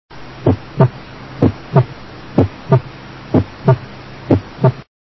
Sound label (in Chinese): 第一心音减弱; XinFeiTingZhen Dog